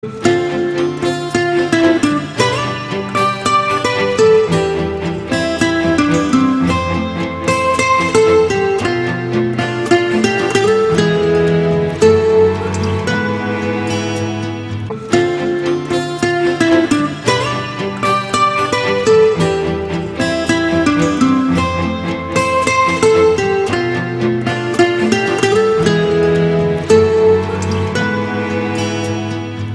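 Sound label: rumba pod gitaru, rumba on guitar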